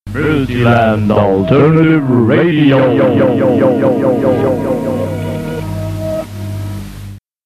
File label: jingles